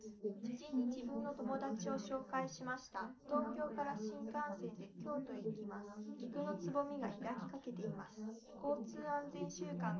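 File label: female, live recording 250ms 5cm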